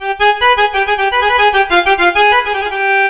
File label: SYNTH, ARABIC